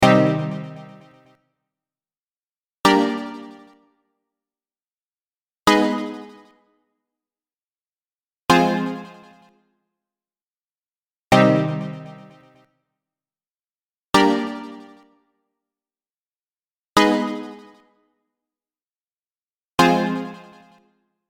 analogue stab; House-Nation; Analogue Stab Generator

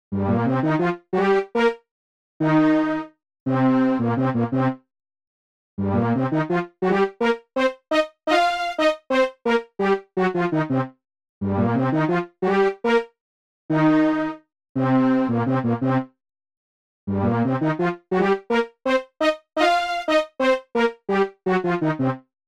Brassy Lead, analogue stab

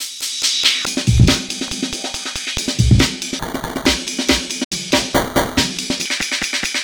Amen Breaker 140Bpm; vst fx bundle

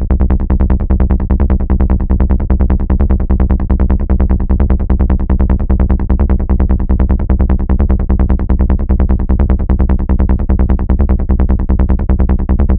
Goa Bassliner, trance presets; abx3 trance presets vol2

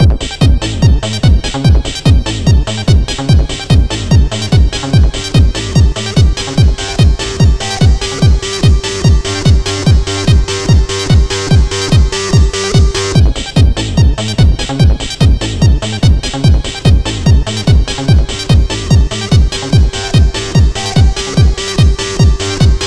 Bastard Trance; 100 acid vol 2

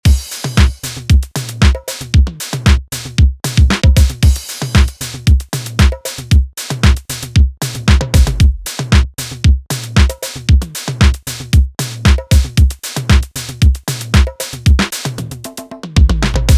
Disco; drum; beat; Drum Blaster: The Ultimate Beat Generator, Sampler Plugin for Modern Producers

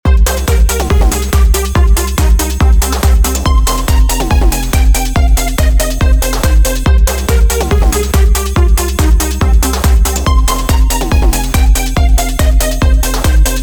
Low Bass And Downing Lead; neo retro 8 bar loops and midi files

